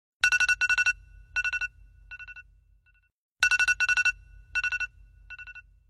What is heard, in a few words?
default iphone alarm ringtone